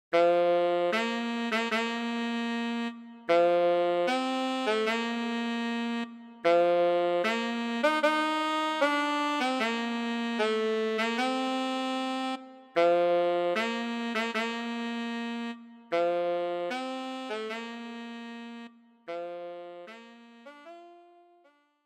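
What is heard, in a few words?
solo tenor saxophone; Hochzeitsmarsch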